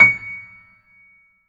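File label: C#7, grand piano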